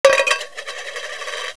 coin roll

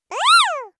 Fairy delight

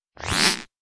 Fly rubber suit

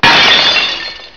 glass shatter

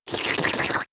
Slurp

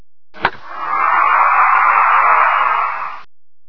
baseball hit; orbitz

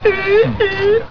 ooh ooh; Office Space

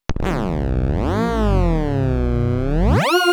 Sawtooth

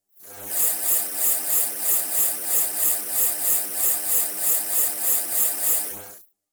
Electric dis hum strobe

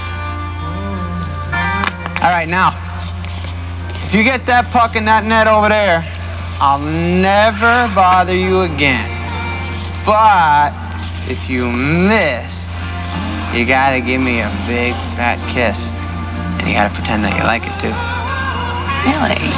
bigkiss; Happy Gilmore

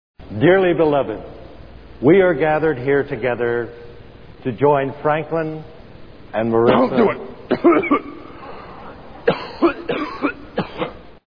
cough; Old School

